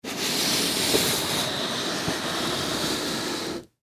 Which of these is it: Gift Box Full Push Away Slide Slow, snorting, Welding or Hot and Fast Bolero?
Gift Box Full Push Away Slide Slow